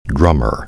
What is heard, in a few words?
drummer